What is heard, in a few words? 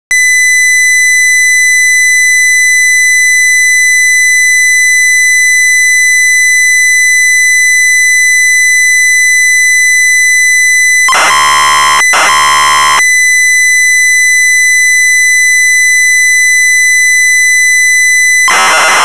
MUSIC BOX; KNIGHTS LIBRARY